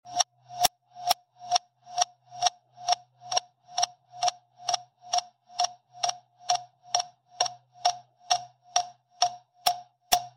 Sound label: stereo doppel